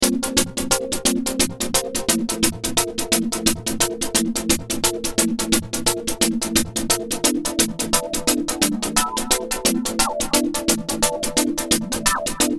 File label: Synth